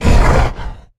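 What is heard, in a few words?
Stealth Attack Grapple; Evolve; Goliath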